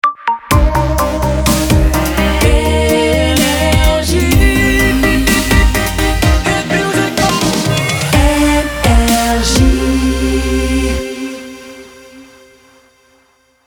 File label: jingle; NRJ